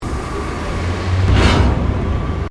MECHANICAL WALKER GIANT METAL STEAM STEP, Giant Robot footsteps; Robots